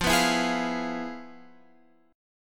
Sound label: F Minor Major 7th Flat 5th Guitar Open F; FmM7b5 strummed; JGuitar